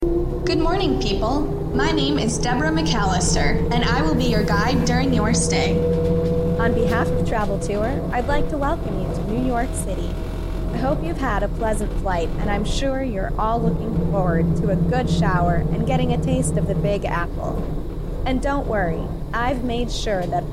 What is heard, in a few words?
NY tour bus noise; NYC